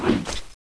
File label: lance special attack end